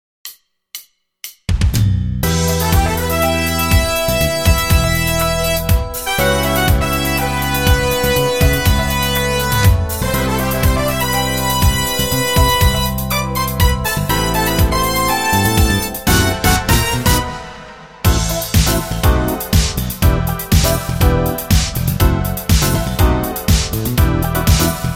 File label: brass minus